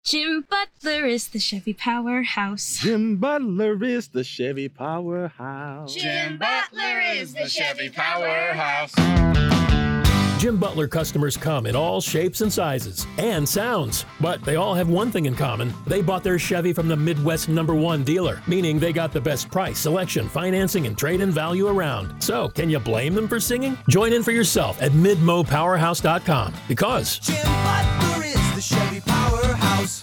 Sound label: JINGLE